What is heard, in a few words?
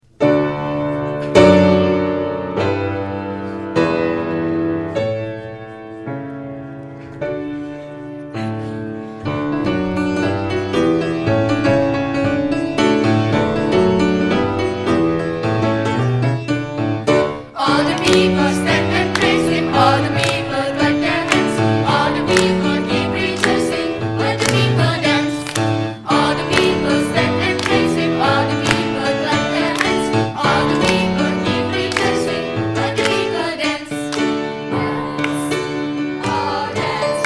Hora Dance